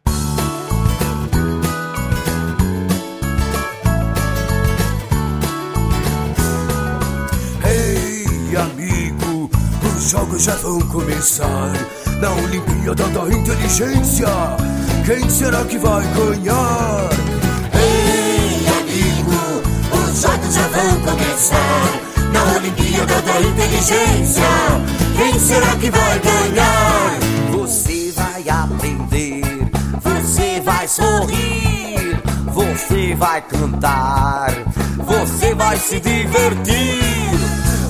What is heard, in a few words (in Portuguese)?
Música